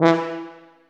TUBA, Solo Brass, Tuba stacc

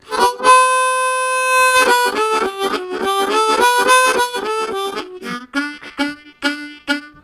Sus Vamp, Harmonica; Zero G

